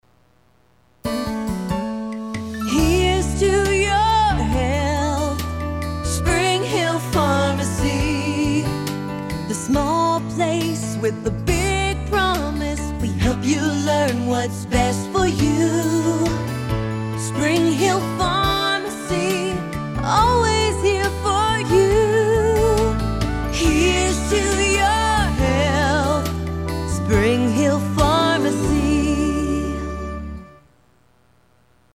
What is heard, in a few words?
Pharmacy, pharmacy jingles; Springhill Pharmacy